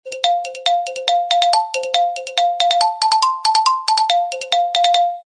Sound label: comedy galloping xylophone